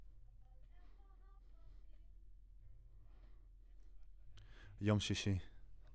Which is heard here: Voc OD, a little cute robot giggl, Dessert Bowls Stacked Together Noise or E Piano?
Voc OD